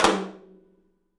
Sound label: TOM; 3D Sound; 3D Drums